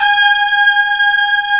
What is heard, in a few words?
C#6, YAMAHA, Analog; USB Soundscan vol.49 - Analog Fantasies; AKAI